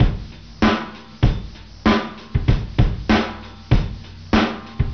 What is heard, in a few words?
Processed Beats; RnB; GeorgePendergast